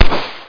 RIFLE

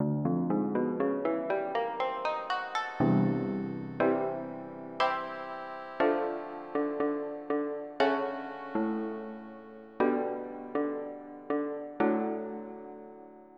Koto; EverQuest

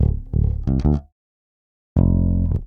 bass loop, Rock; ACID MUSIC

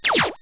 Beam; Treasure Galaxy (1994); Learning Company

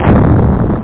Bomb Expl; amiga; DiamChall

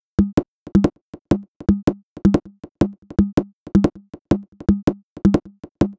PERCUSSN, Drum Bass, 160; eJay

